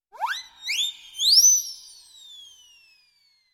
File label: Funny cartoon flying sound effect